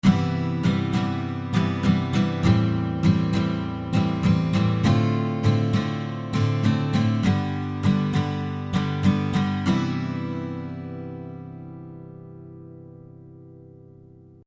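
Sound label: Guitar rhythm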